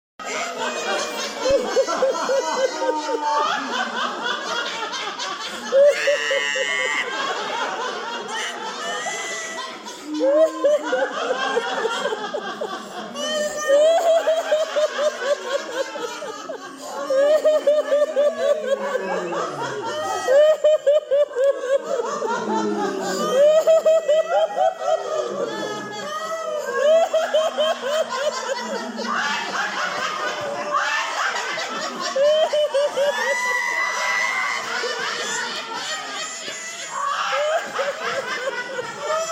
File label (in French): méditation rire; brest